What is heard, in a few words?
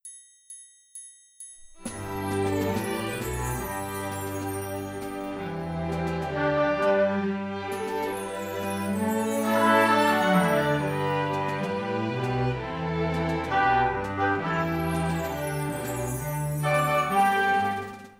enka